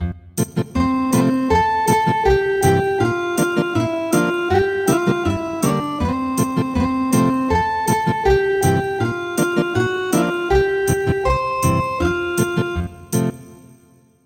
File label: Ethnic Guitar